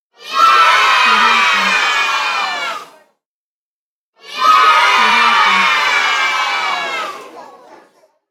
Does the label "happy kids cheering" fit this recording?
Yes